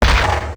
giant pyro step; Garry's Mod; tf2; mvm

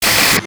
medi shield burn, weapons; tf2

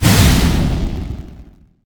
Apollo Missile Launch; lirili larila

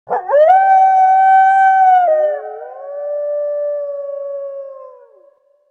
Wolf Howl; brainrot; wolf moon; lupodella

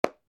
clap; pyro

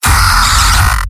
m pain sharp; demoman; tf2; garrysmod; mvm

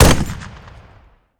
apache single; cameraman3

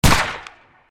shoot; kawitto banditto; kiwito bandito

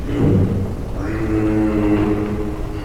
call, giraffe; zoochosis pack1

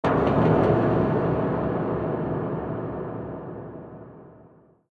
spacebase bang; ambient; Garry's Mod; tf2

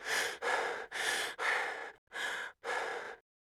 dodge tired; Garry's Mod; tf2

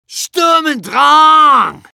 cheers; medic

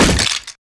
sorcery staff drop; taunt; Garry's Mod; tf2

